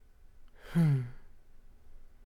Suspiro

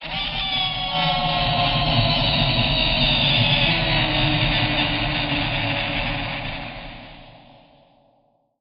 Grito Terrorifico

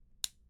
Prender Bombillo

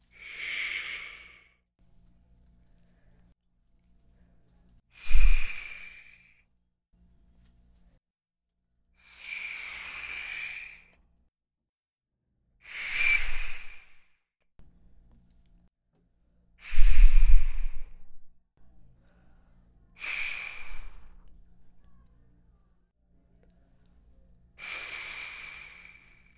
Viento

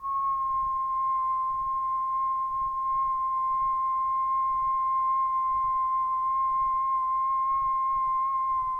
Silbido

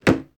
Caja Caida

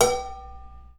Olla

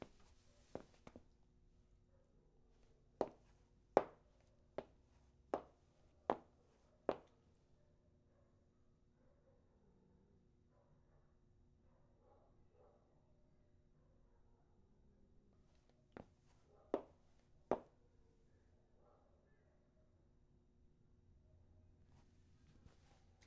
Pasos Corredor

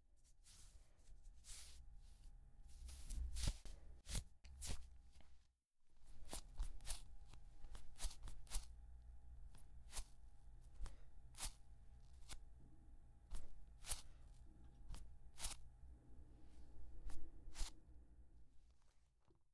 Ropa Suave

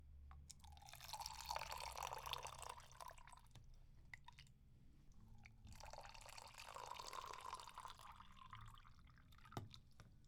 Agua Sobre Un Recipiente

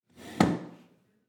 Golpe Silla

